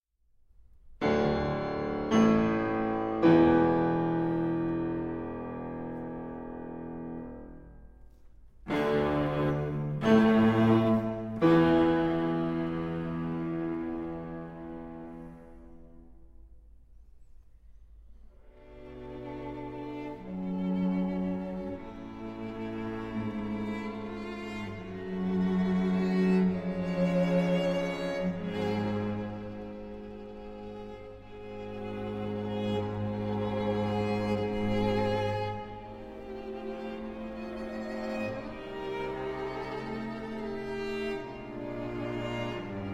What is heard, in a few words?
Concerto for Piano, Violin and String Quartet in D Major; Chausson; Op. 21 I. Decidé